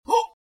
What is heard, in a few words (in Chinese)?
嚯！-吓一跳